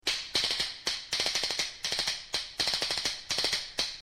tejoletas; reciclar tocar

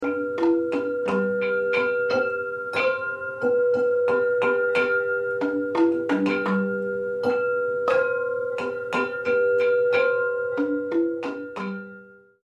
carillon tapadera; reciclar tocar

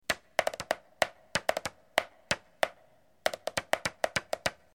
toca carpeta; reciclar tocar

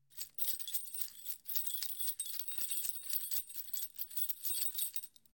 toca llaves; reciclar tocar

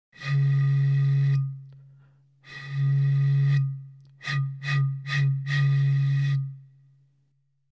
toca botella; reciclar tocar

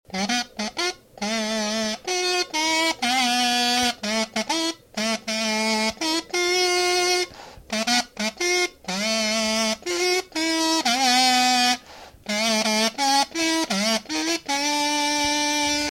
mirliton; reciclar tocar

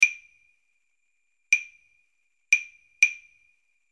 guajira claves; reciclar tocar

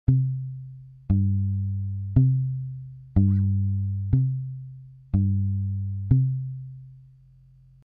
contrabajo; reciclar tocar

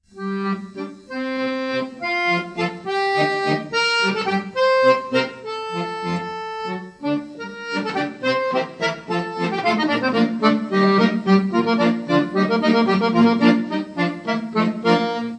acordeon english dance; reciclar tocar

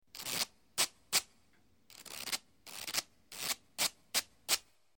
tocata anillas; reciclar tocar

